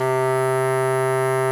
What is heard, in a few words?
kortholt C#2; SoundBytes - Early Patches